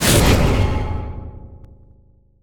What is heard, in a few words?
silence; dota; drow ranger